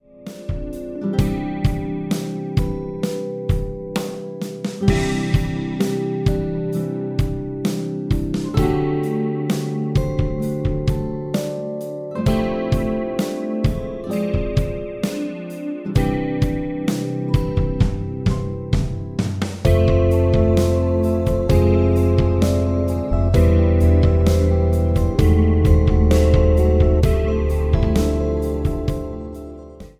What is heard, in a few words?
Optimistic Soundtrack, ringtone; MIUI12